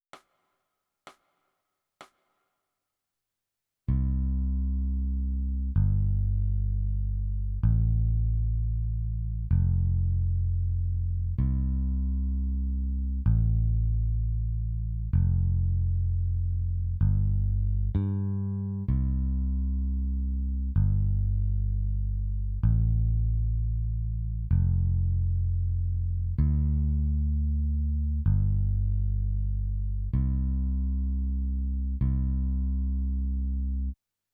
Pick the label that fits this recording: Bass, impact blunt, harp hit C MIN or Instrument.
Bass